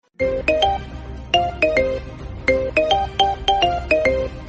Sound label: notification